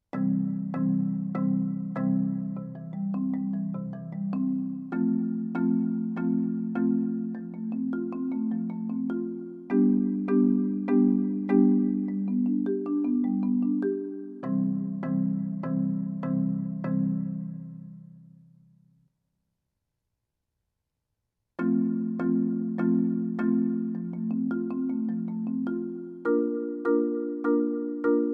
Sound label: 4 mallet exercises